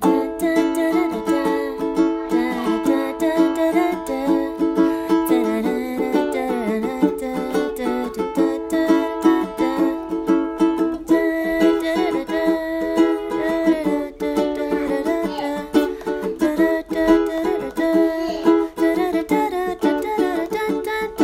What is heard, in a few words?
verse chorus melody idea